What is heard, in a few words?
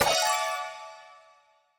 reward